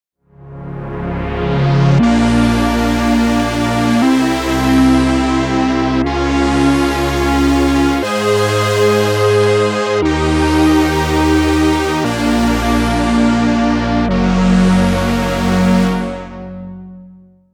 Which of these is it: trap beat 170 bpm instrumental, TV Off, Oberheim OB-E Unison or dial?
Oberheim OB-E Unison